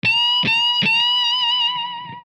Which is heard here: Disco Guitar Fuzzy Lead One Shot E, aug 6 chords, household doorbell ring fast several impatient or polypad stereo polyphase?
Disco Guitar Fuzzy Lead One Shot E